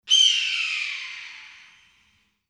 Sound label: Red tail hawk